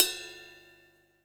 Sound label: ride, Drums Acoustic; USB Soundscan vol.10; AKAI; DYNAKIT 3